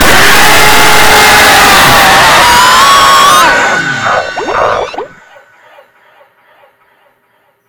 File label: jumpscare